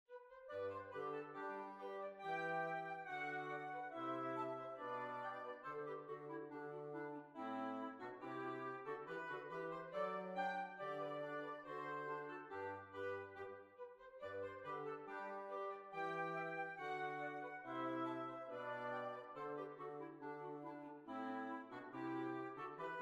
Hornpipe; London Hornpipe

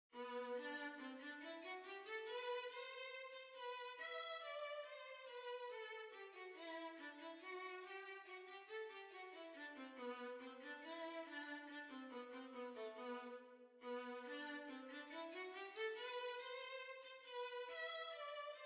Violin

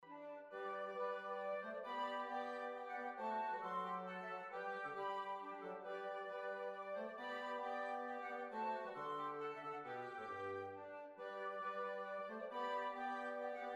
Tyrol Waltz

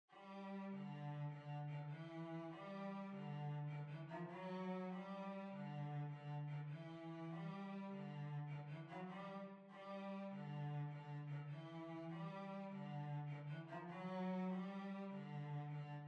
Violoncello

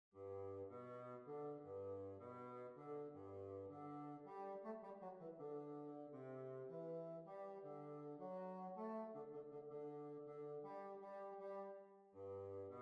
Polka

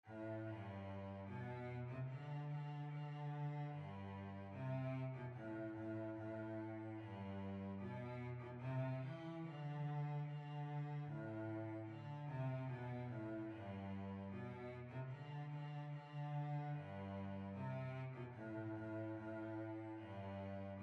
Violoncello

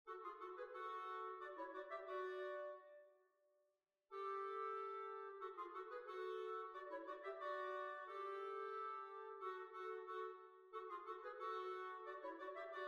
Hornpipe; Miss Richards Hornpipe; B flat

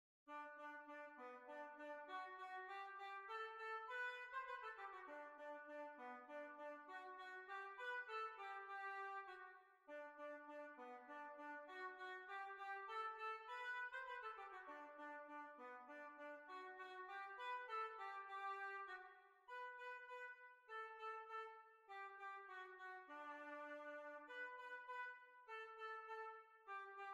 French Quadrille

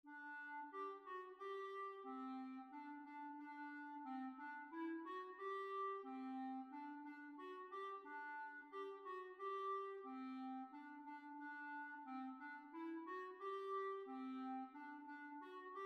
Hornpipe; Tumblers Hornpipe; B flat

